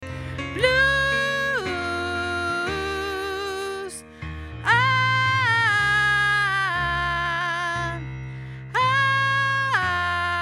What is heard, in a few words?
tenor